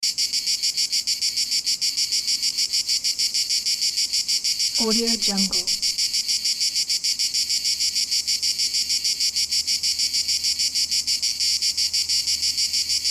cicadas

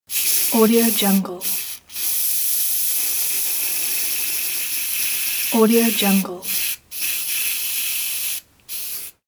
spraying; 3dhome

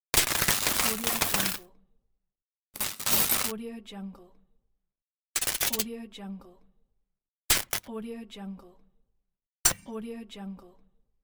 electric welding

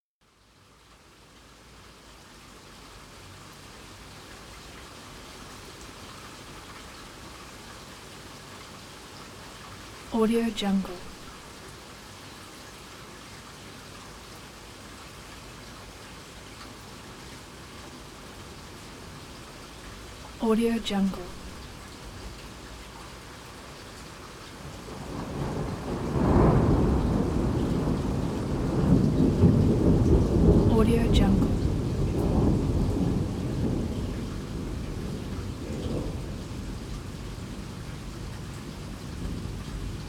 rain and thunder

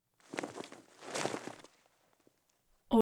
cloth handling

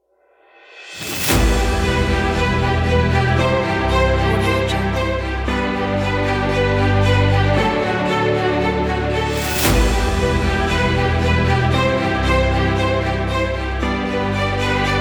epic cinematic motivational kit; stereo jam

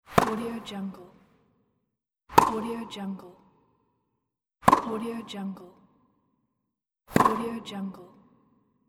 tennis ball hits; mountainaudio

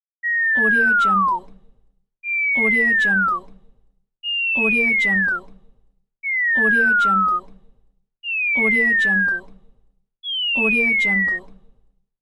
cartoon falling; cook sfx

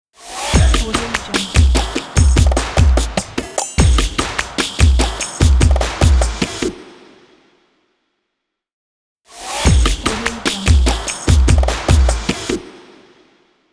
company drums logo

